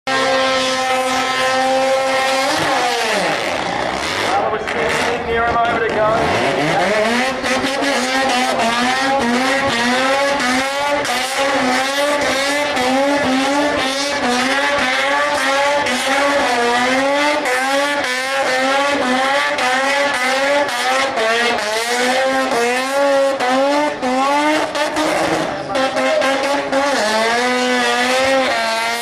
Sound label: rotary burnout